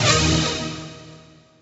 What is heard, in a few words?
hit, pinball; Excalibur; Zen Pinball 3D